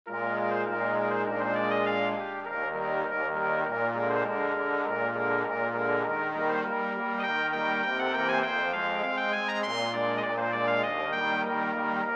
Polka, Brass Quintet; Sans Souci Polka

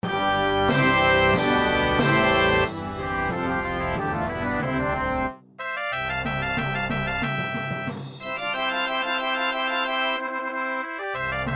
drums and cymbals organ and brass; Praise the Lord with drums and cymbals

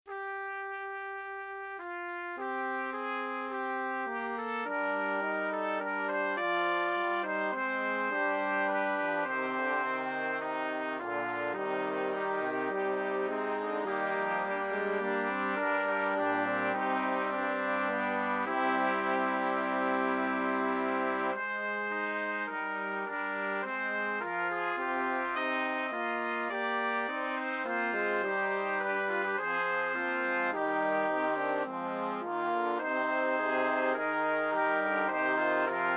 Mass for five voices, Brass Quintet

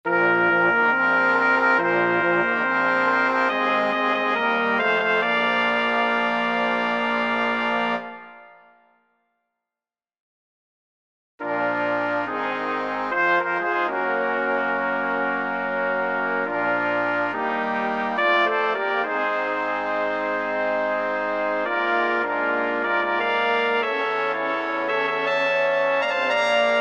Fanfares Brass Quintet; Five Short Fanfares

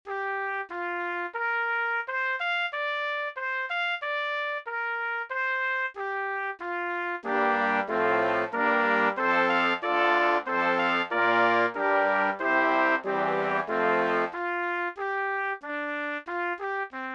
organ and brass; Promenade

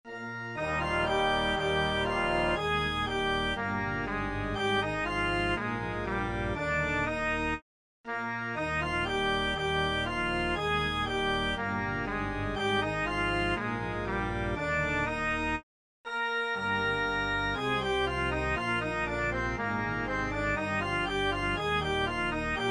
organ and brass; Sleepers Awake

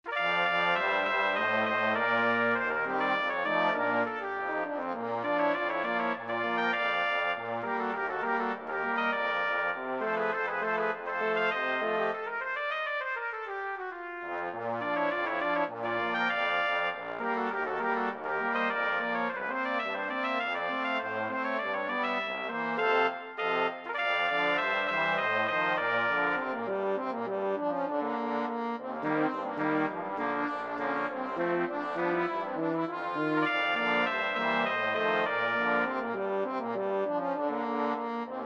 Rag, Brass Quintet; St. Louis tickle Rag

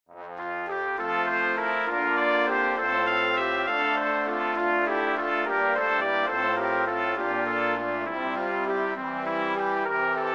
Brass Quintet; Jesu Joy

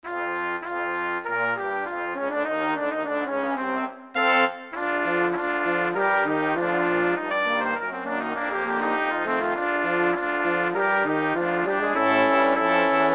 Rag, Brass Quintet; Country Club Rag

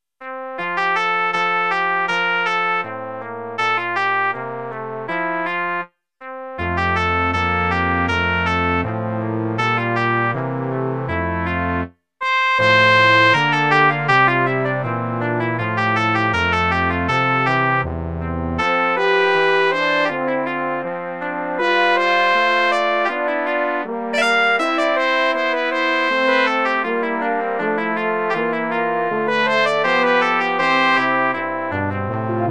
Sleepers Awake, Brass Quintet

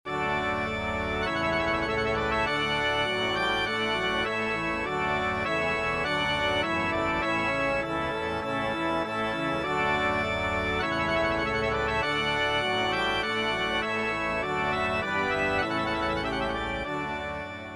Trumpet voluntary, organ and Brass

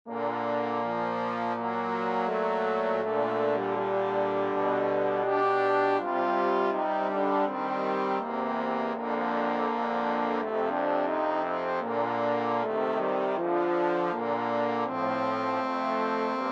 Brass Quintet; Panis Angelicus

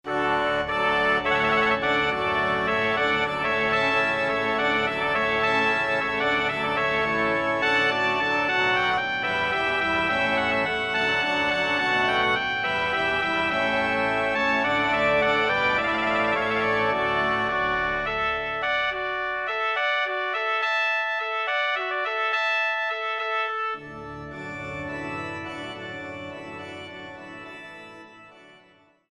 Allegro Maestoso Organ and Brass

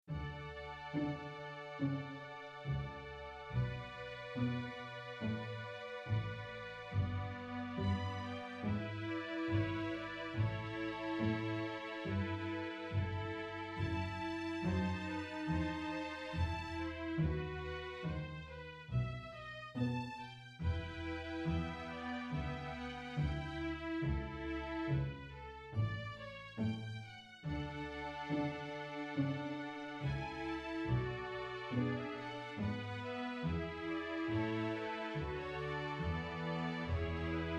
String Orchestra

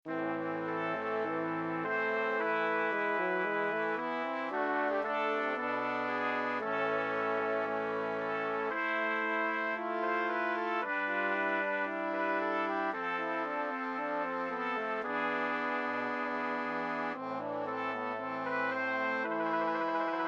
Pavane, Brass Quintet; Byrd

